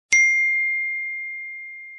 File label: Message Tone; Iphone